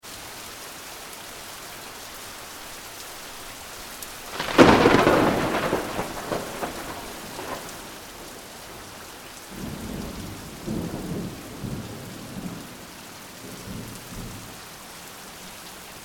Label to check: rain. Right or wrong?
Right